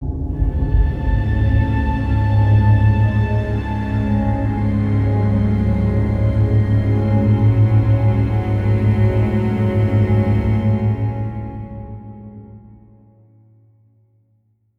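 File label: Music Volcano; From Dust; PS3; Xbox 360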